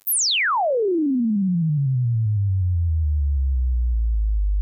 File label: Stimulus, Acoustics Test, Mic; Labview-Program